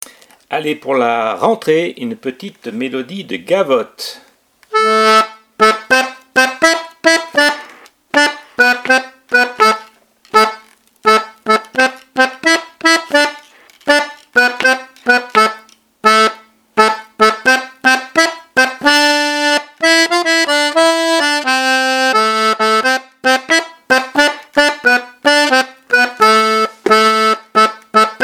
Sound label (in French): Melodie Gavotte